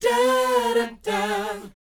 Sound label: BOYZ F, Vocal Planet, R&B Dance; Spectrasonics Vocal Planet